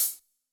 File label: HHCL, Yamaha SY85 Drums